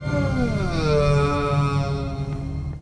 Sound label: eeeeuuuu